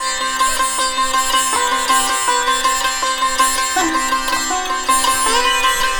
SITAR, Indian Traditions

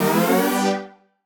G, Poly Chord Hits, Ramp Up; future rave

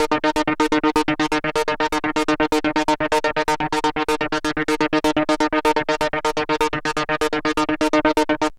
LFO 125, SYNTH SEQ; AMG; Global Trance Mission VOL-2

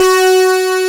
G3, SYNTHESIZER ANA LEAD; AKAI S6000 CD-ROM - Volume 1

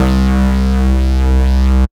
C Bass; Electronisounds; Vibration Expedition One Shots

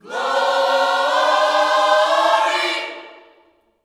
GLORY, Vocal Planet Gospel

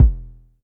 Polite kicks long E1; LIMAT Div Dept; Drums

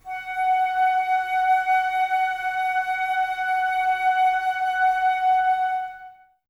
Flute, Woodwind; Miroslav Vitous - Woodwind Brass Ensemble

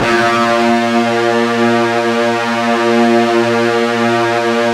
FUZZ A#1, Guitar; East West; Steve Stevens Guitar Samples Collection

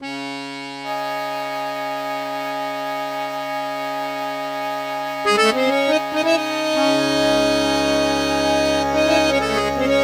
Accordion, Cinematic Funk Urban; Sonic Foundry (Sony Creative Software); Cinematic Funk Urban Tone Poems

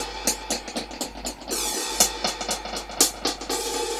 Dub Drums B Echo Hats 120bpm; musicradar

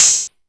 Hat, Yamaha DD-20

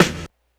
snare, Hardcore Breakbeat

